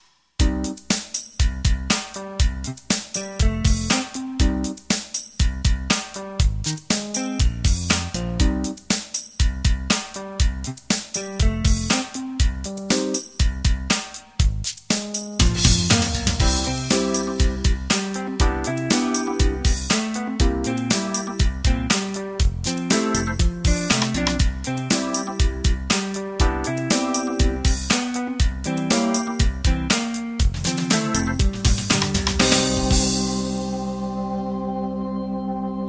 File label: Fusion